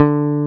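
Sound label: D3, Bass; Best Service Gigapack 2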